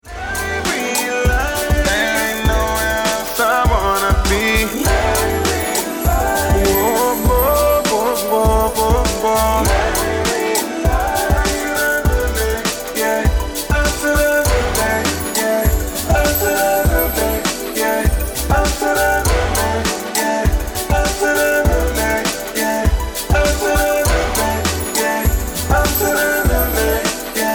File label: JINGLE intro Music